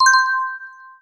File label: coin Sound; MQ2